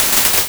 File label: layered Beats